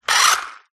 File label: leisure radio controlled car accelerate away short burst; zapsplat